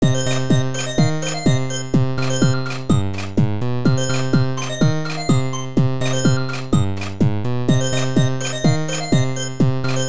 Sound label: decentish beat